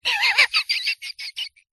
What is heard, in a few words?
cartoon voice high pitched laugh wheezy